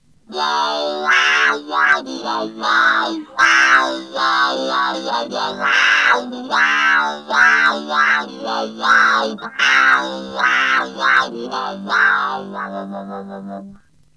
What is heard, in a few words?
Chords